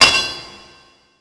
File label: forge hammer